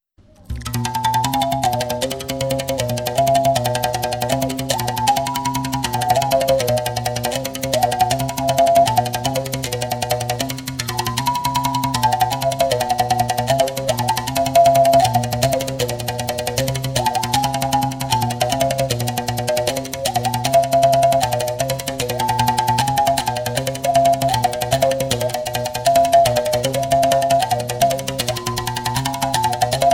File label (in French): Arc a bouche; Mbela